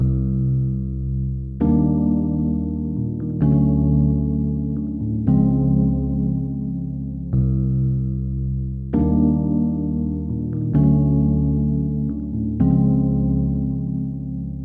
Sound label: rhodes